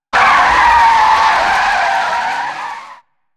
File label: skid; soundscrate